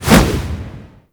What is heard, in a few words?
Finisher Whoosh; Warframe; Kuva Lich; Finishers